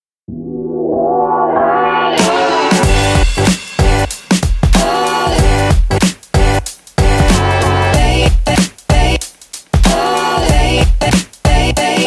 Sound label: Energetic Urban Hip Hop; SafeMusicList